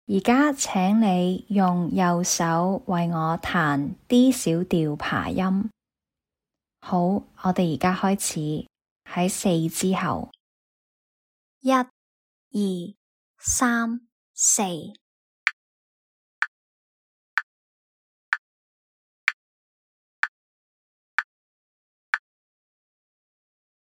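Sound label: D minor arpeggio right hand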